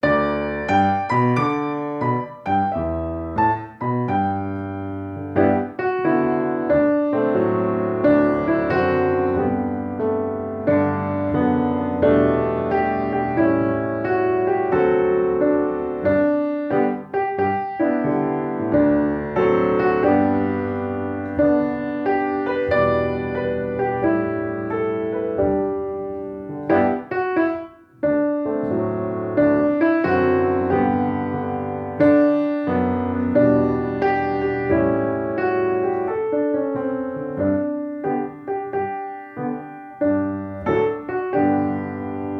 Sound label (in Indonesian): Musik Klasik Untuk Bayi